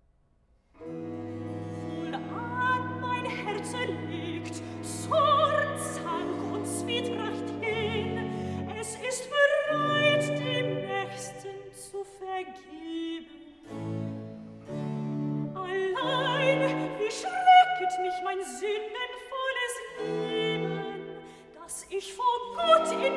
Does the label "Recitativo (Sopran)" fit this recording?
Yes